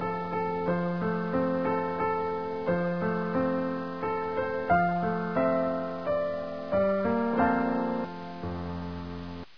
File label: piano butt